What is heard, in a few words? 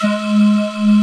LINEAR SYNTH